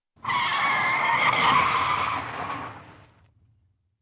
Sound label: Car, Braking Skid; Emergency; BBC Sound Effects Library